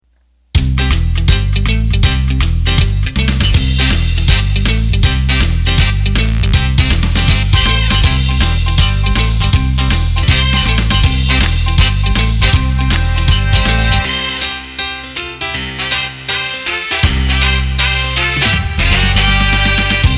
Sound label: Piano Rock; roland bk7m